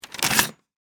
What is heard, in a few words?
Typewriter Remove, Technology, Typewriter, Remove, Paper, Fast; Smith, Corona; Typing